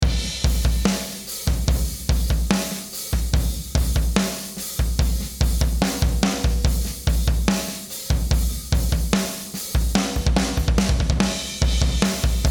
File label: drum superfuse